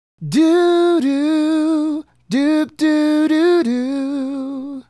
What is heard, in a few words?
Male Solo, Hip-Hop and RnB Vocals